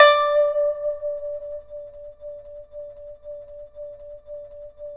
PIPA D4; INIS; Opium

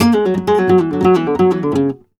Remates De Pulgar, WAV Guitar, Flamenco

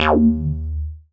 SWEEP BASS; Masterbits; Dance Trax

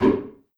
Oneshot; Sonic Foundry (Sony Creative Software); Esoterik Beatz

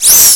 Organ Music; SNES; Wayne Gretzky and the NHLPA All-Stars

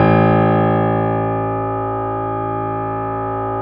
Keyboards Acoustic, GRAND P; USB Soundscan vol.17

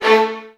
VN FX, Virtuoso Strings

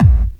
KICK, Hip Hop; HipHop Kick Snr Hat; PlatinumPhattCD1; E-MU Producer Series Vol. 8 – Platinum Phatt

